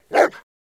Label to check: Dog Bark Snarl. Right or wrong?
Right